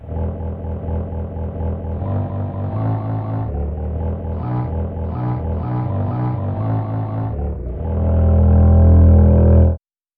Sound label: violin bass